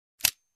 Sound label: Empty Pistol trigger